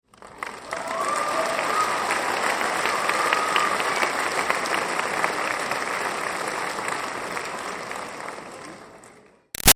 Crowd Applause Audience Grad Med; Auditorium; Graduation; Human; BigRoomSound

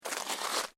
SNOW SCRAPE; Material components for constructing more complex sounds; Sound Effects:Weather

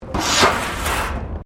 METAL BIN SCRAPE CONCRETE; Metal (Sound Effects:Materials)

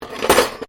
Cutlery general drop drawer, Household cutlery; Kitchen

